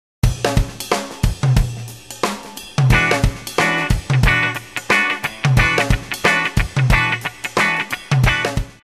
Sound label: Rhythm Guitar